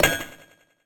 reattach

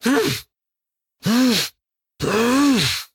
Monster Spawn; Mouthless Monster

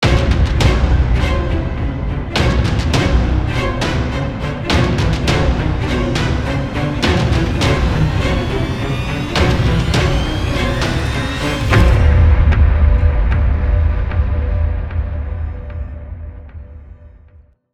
Short Signal

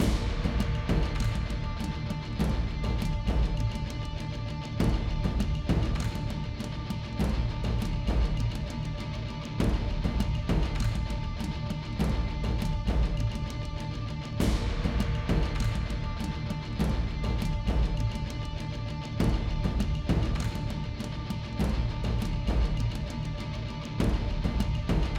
Combat Low Mid

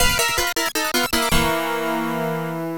Jingle Game Over; DS; mister slime

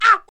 Blue Hit

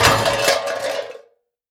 Crash Objects Small Metal; car simulator 2

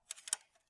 Rotate Small

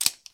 cowboy reload

